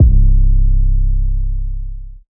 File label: 808 - C; Cymatics; Light Free Sample Pack; Drum One Shots